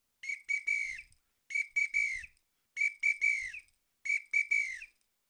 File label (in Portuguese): Apito acceleration